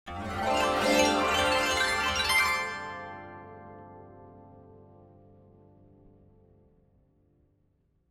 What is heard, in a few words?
ambient